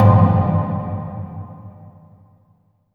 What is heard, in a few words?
RUBBER WAL, AMB. MALLETS; Spectrasonic Distorted Reality 1